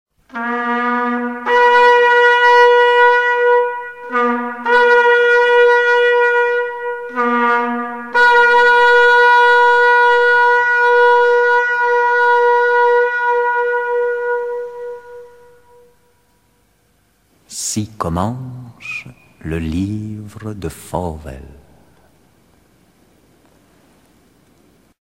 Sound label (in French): Fanfare d entree Moyen Age; Roman de Fauvel